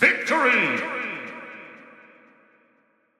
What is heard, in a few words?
noise victory; Beat Saber Clear Noises